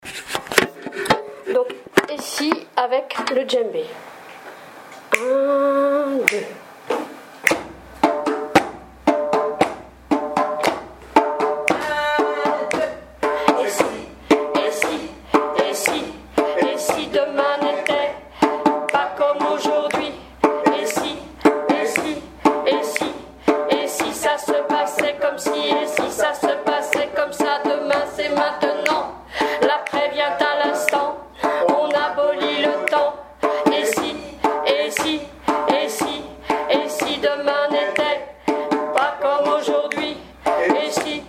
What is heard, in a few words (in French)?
parole et Djembe; Gare Et Si